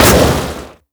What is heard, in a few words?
Shoot; Skylanders Cloud Patrol; Arcade, iOS, Mobile; HotHead SFX